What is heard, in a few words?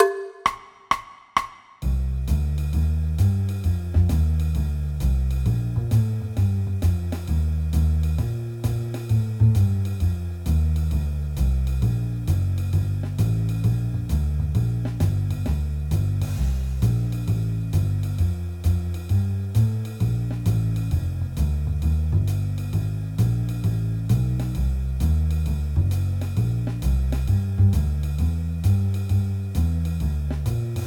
guitar, Jazz; Honour Bands